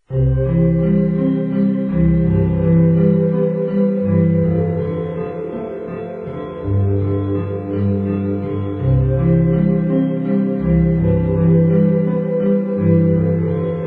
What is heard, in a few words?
Piano Instrumental; Instrumentais